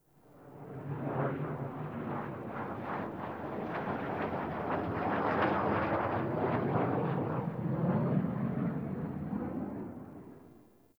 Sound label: Distant Ambient Fighter Jet; Warframe; Ambience; Nineteen Ninety Nine